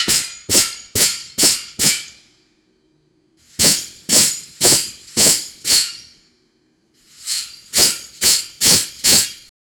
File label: bear movement heavy armo